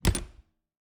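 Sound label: Refrigerator, old fashioned, wooden, steel, brass clasps, hinges, close; Eiravaein Works; Vaeyan IV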